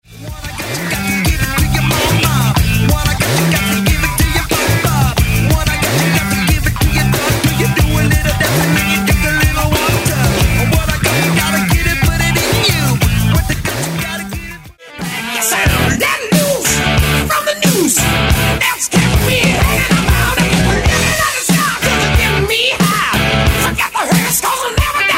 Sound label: 80s Rock Megamix